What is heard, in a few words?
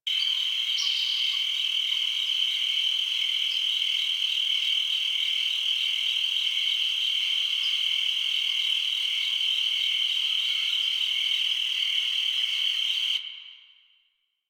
Beach Night Insects High Loop; Ambience; Warframe; Grineer Beach